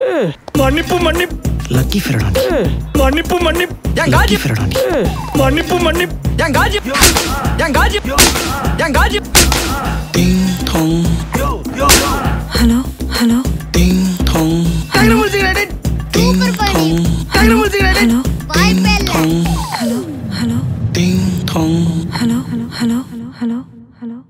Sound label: Ding Dong Promo Ringtone; Super Deluxe